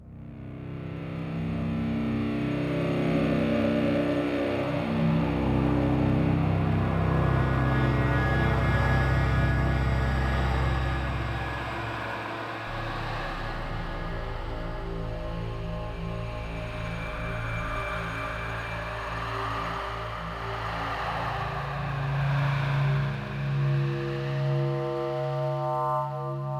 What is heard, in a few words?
Night Amb Stinger, gamerip; dying light